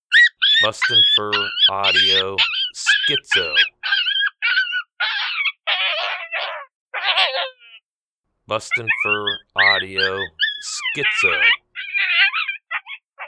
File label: Young Coyote Pup in distress; BFA Skitzo